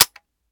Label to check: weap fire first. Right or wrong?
Right